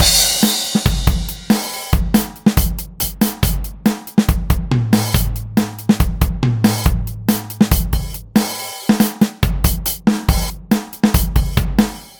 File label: Rock; vitamin girl windows gamerip 2017